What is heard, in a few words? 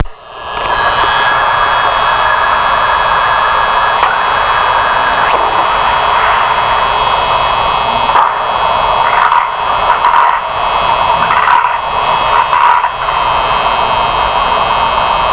grinding; BRUXNET